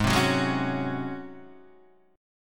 G sharp 11th G sharp, down, Guitar, Half Step Down